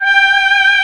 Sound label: VO G5, ANA VOX, SYNTHESIZER; AKAI S6000 CD-ROM - Volume 1